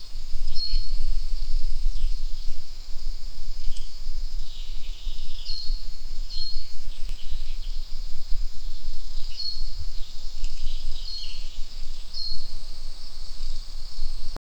ambient forest sounds, birds chirping

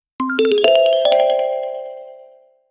short negative casino sou; coin clink

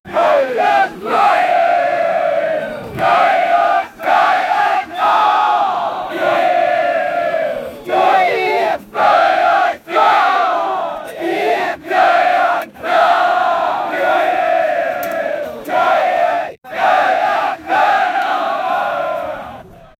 marching soldiers chant

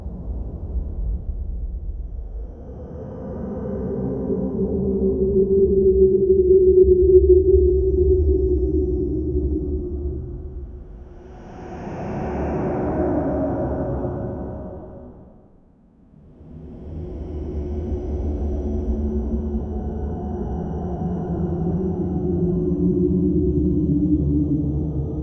the eerie hallucinatory s, cave

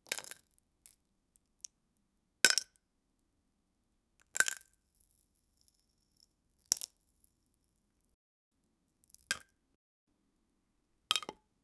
vegetable toppings drop; dining

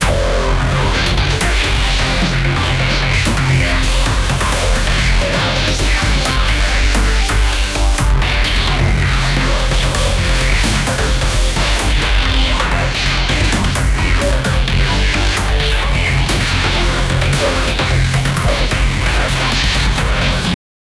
neuro bass aggressive

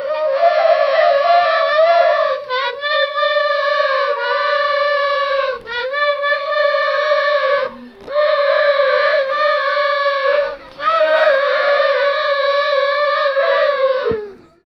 english football ultras, bumping

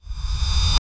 light on dark; sfx

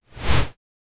short whoosh transition

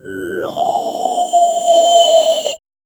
final miserable collapse, death

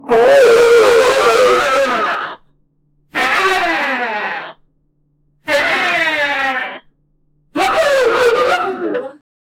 stone effect; bum

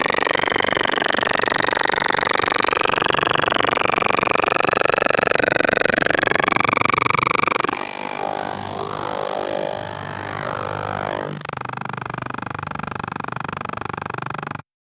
tailoring machine sound

